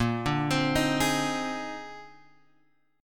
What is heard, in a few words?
A sharp 11th; A#11 Chord {6 5 X 5 4 4} arpeggiated